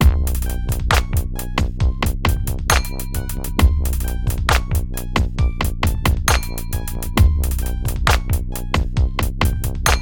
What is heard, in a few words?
Rap Loop; The Jackbox Party Pack 5; Jackbox Games